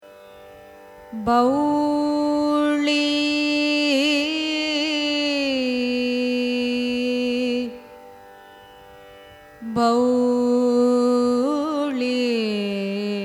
raga bowli signature; carnatic music

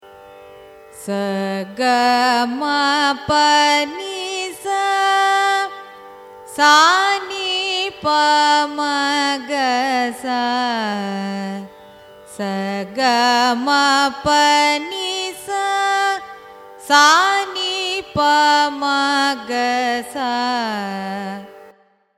raga amrithavarshini arohanam avarohanam; carnatic music

